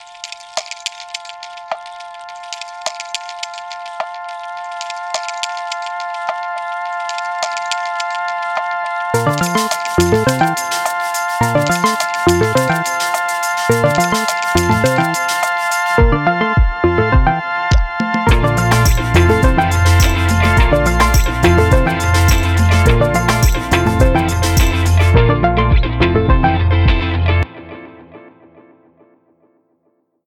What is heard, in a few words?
jungle pop